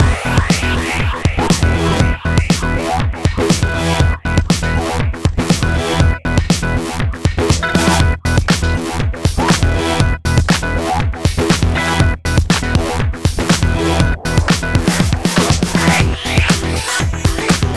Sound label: race